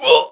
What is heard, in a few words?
uooh; 1997 MMX LAN PACK (win 95); Army Men